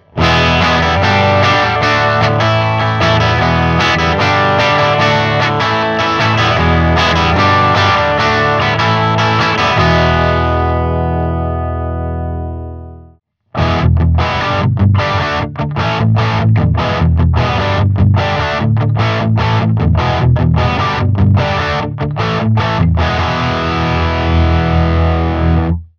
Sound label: HB G412s vintage, guitars; kaappitesti